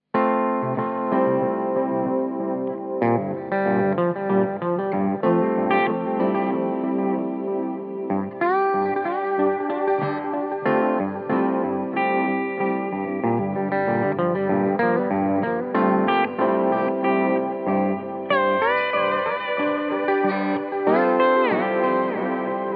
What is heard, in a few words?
Echo Mod